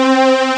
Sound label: Strings, Amiga Soundtracker